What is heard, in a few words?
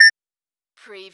Servo Panel Sound Beep short